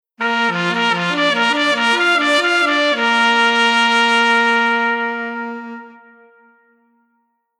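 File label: Horn Unison Trumpet Tenor Sax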